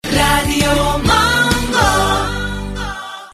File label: jingle; Mango